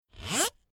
Sliding Fingers Across Plastic Ribbed Pipe Rising Pitch Zip Cartoon; Tom Hutchings